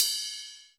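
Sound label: HR16 ride bell; Crypton - DrumBase5000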